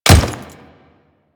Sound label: Default Step, Footsteps; Warframe; Grineer Royal Guard